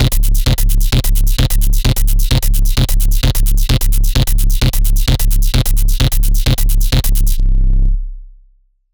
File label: sub sonic bass booms; high hat